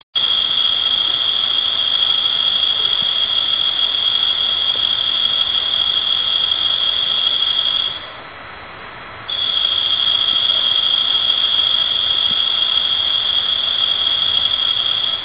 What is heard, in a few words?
autumn ringing